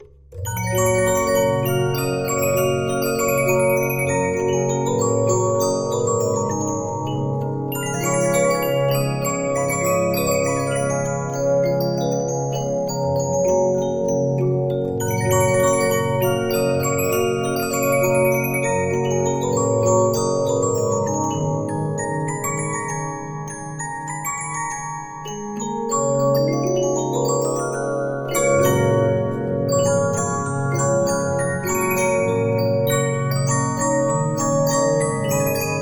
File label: Regina, 15inch disc musicbox